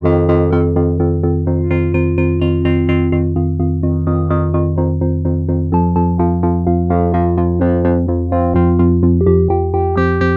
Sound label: Independent Timbral Control; ポリフォニック・アフタータッチ; sequential